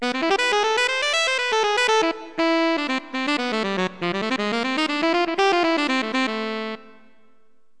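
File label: alto sax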